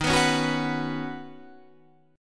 D sharp Minor 7th Sharp 5th D sharp, down, Guitar, Standard; strummed; capo=2